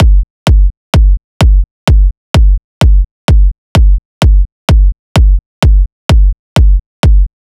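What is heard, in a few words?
Drum Loop 128BPM bass drum; VEC5; Drumloops; Multi Loops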